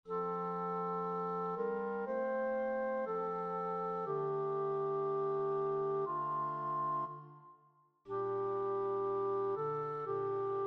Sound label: soprano; In the Bleak Midwinter